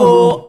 Vocal Oneshots; Vengeance Samples; Vocals

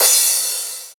Crash; Electro Flux Sound Kit; ED Crash

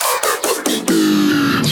Fill 140 BPM

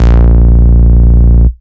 Root A#, Ultimate Bass; Vengeance Samples

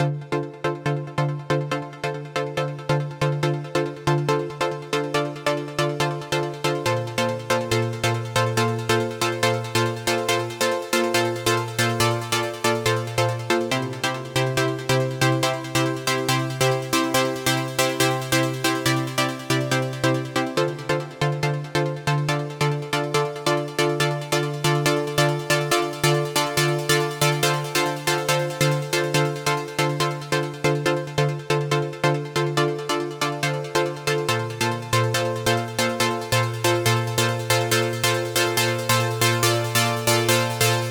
Pluckchord Full 140BPM, D minor; Unlooped; Vengeance Samples

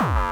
BD Distortion, Bassdrums; Vengeance Samples

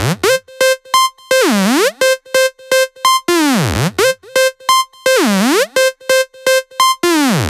Melody Kits 128BPM Root C; Vengeance Samples

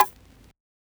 HiHat; Ghosthack

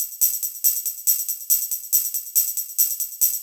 Tambourine, 128 BPM; Vocal-Hook Kits; Rap

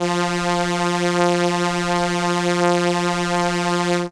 Violin F3, ARP Solina, synth; musicradar-synth-samples

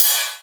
Ride, Cymbals; Vengeance Samples

